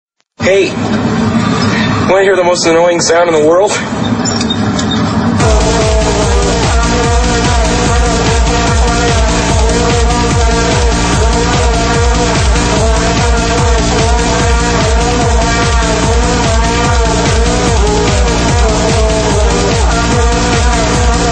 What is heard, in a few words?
Vuvuzela (Techno Remix)